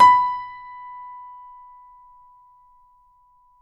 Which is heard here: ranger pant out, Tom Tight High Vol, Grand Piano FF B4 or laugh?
Grand Piano FF B4